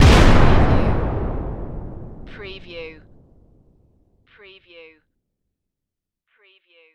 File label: Explosion Heavy; Sound Effects Bible